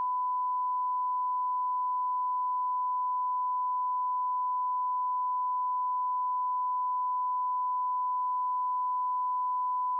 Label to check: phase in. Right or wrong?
Right